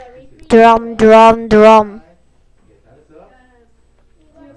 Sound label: drum